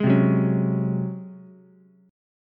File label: Ab 11th down Ukulele C6; strummed; JGuitar